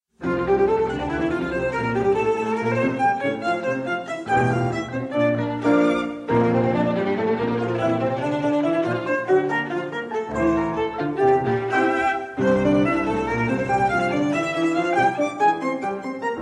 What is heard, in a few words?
Sonata in F minor